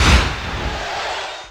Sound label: weird; Best Service - XXL Production Effects